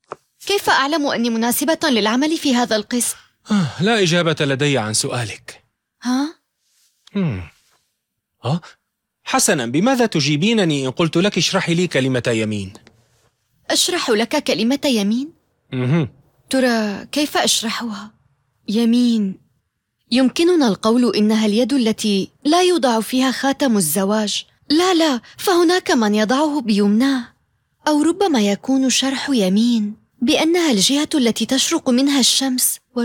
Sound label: vocals